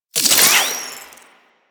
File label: Necramech Repair Drone Idle Movement; Enemies; Warframe